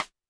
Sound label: Snare, Roland TD-20